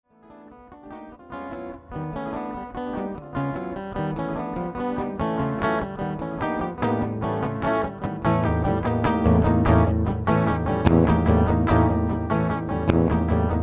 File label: jazzy; barcelone